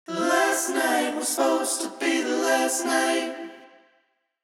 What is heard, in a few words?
BG Vox BEFORE